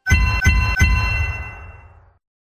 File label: coins twist